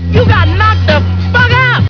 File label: knocked out